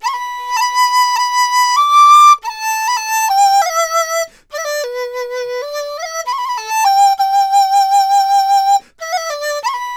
DIZU, 100; INIS - Opium; DIZU 100-G

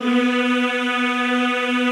Classical Choir, B2; Best Service; Extended Classical Choir

